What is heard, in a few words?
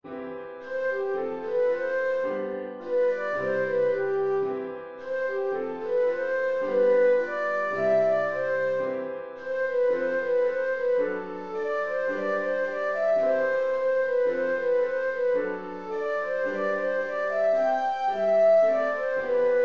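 instrumental descant; I Am Jesus Little Lamb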